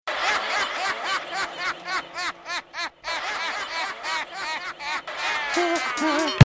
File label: echo